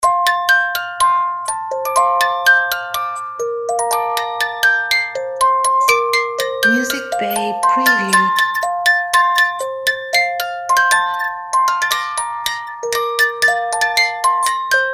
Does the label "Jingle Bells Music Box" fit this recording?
Yes